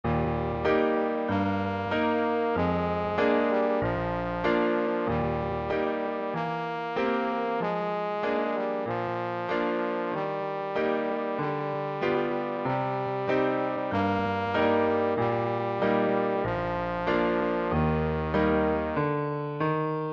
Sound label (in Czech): melodie salter, sólový part pro trombón, klavírní doprovod; Přednesová skladba pro trombón; w salter